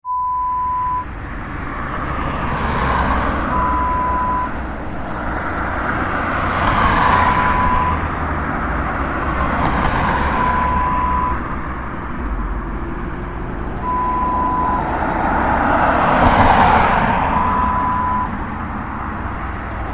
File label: Country Road sounds